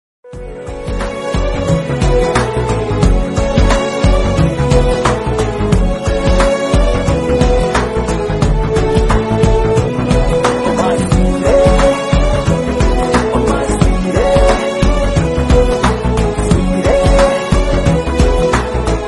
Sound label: jesus love telugu flute bgm